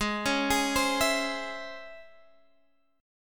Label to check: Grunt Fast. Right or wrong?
Wrong